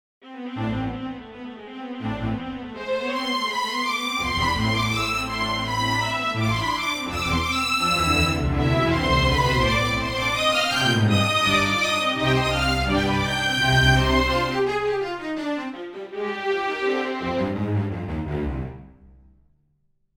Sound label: strings; Harry Potter strings; ewqlso